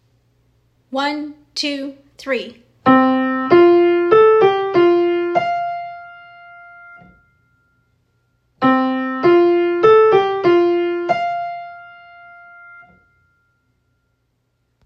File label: clapping